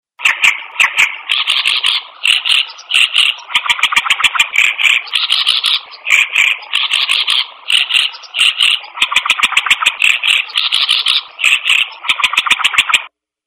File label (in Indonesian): burung asing